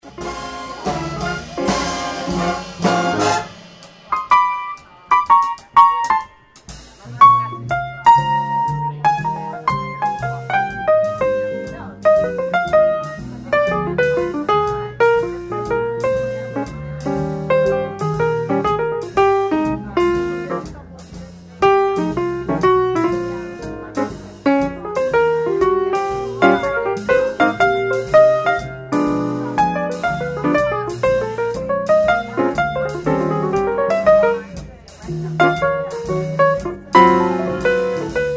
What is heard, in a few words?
piano feature